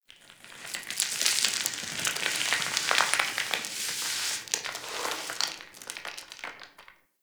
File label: Settlement Sand One Off; Warframe; Grineer Settlement